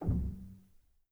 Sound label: rel, Grand Piano; bitKlavier Grand; Piano Bar